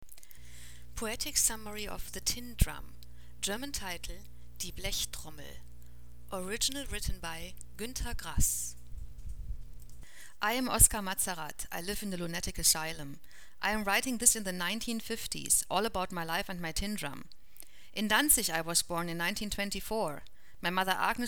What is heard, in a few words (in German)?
blechtrommel